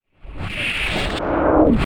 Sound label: reversed pitched wind whoosh; hollywoodedge